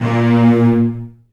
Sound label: A#1, Virtuoso Strings; ILIO; Kirk Hunter Virtuoso Strings VOL-2; 10CL TRM FX